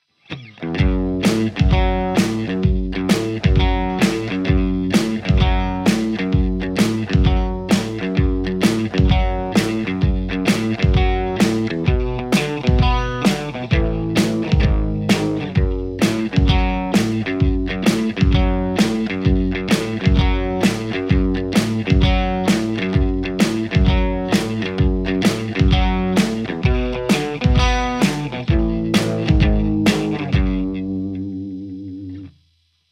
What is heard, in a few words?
Les Paul JCM800 hot; mercuriall